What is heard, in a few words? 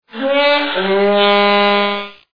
Debbie Downer Horn